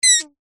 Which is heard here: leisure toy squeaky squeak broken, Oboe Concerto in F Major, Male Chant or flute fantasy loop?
leisure toy squeaky squeak broken